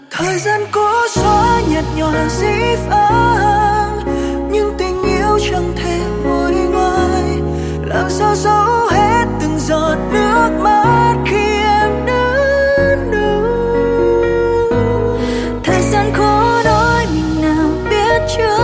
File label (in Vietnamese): Nhac Chuong